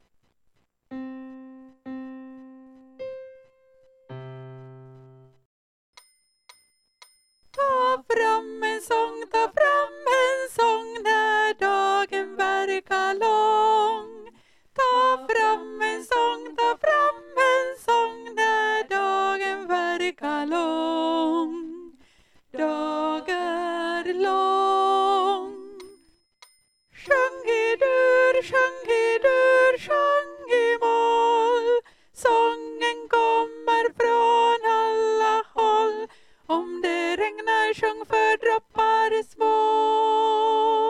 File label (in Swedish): Vaenskapens sang tenor